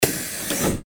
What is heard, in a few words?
bus window